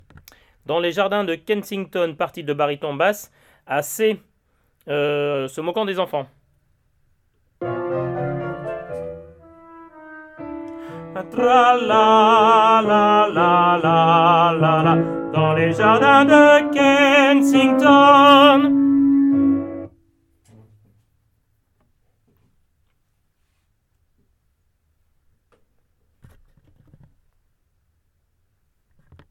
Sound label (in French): Dans les Jardins Bar Bass